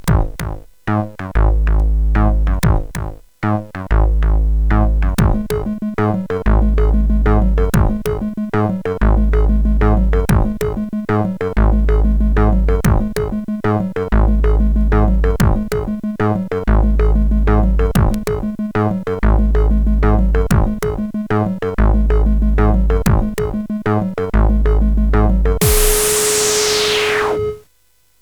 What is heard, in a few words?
Countdown Intro, sid, CSG8580R5